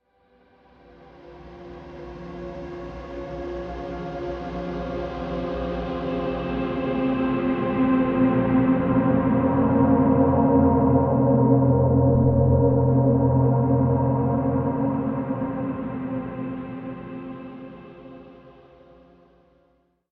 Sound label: Amb Drone; deadcore